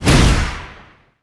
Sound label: Windy Raid; Qickskil